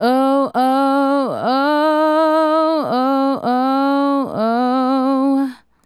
Female Solo, Hip-Hop and RnB Vocals

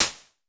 SN Basic, Vermona Mono15 Drums Snare

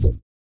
ELASTC TUBA, Vocal Blues Soul; Spectrasonics; Planet

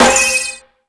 House Machine, Drum Machines

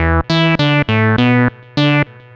Moog Bass; Sonic Foundry (Sony Creative Software) - Rondo Brothers Trip-Hop Loops & Samples; Liberation

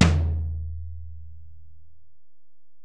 Tom, Alesis DM-PRO, Drum Machines, Toms

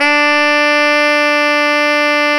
SAX TENOR F, SAX Tenor ff

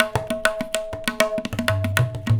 100 TABLA, Indian Traditions; USB Soundscan vol.45; AKAI

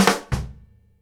KICK SNARE, Live Snares; PlatinumPhattCD1; E-MU Producer Series Vol. 8 – Platinum Phatt

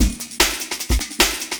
Beat, Futurist Drum & Bass; Drums & Percussion

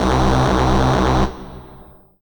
RAVE, RAVESYN

